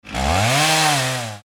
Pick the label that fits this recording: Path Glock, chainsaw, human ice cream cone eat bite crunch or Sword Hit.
chainsaw